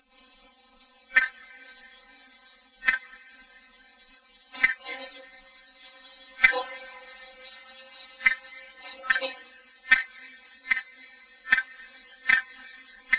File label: Castanets Violin